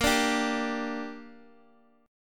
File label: A sharp 6th A sharp down Guitar Open D